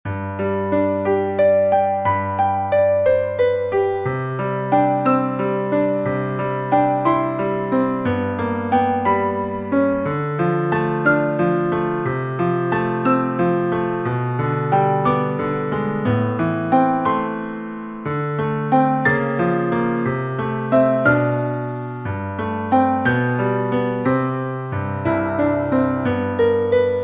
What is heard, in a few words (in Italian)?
violoncello Piano; tu scendi dalle stelle